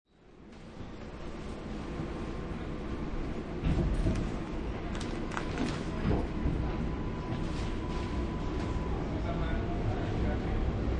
Subway Train Riding Onboard, NYC Subway, onboard underground train; NYC Subway, onboard underground train interior; Sound of train tracks, squeaks and few people; Lynne Music